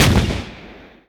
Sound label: boom, skyrocket; xbmc4xbox; screensavers; rsxs-0.9